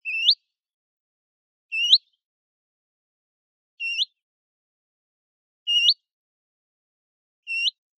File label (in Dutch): Fitis; Kroondomein